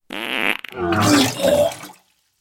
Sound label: fart and vomit